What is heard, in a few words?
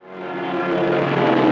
plane fall; raydium